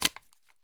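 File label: mp5 weapon mag out; Escape from Tarkov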